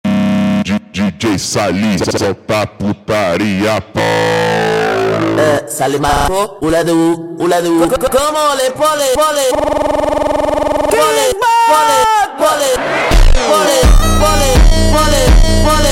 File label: Funk slowed